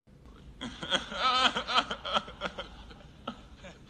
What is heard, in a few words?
Laugh